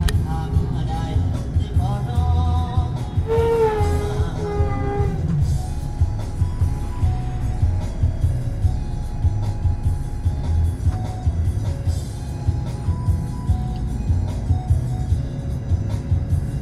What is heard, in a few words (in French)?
Laos dans le bus de Vientiane à Luang Prabang